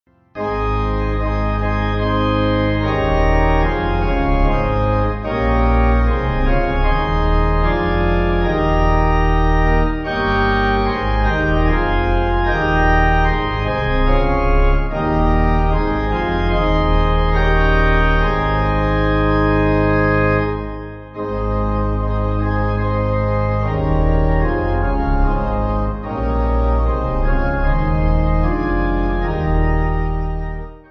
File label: O For A Faith, Pipe; Evan